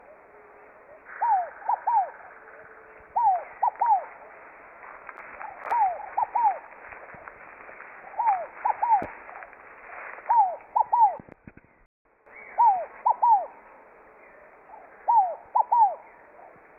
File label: columbina squammata